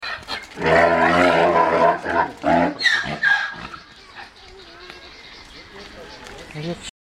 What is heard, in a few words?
zebra bray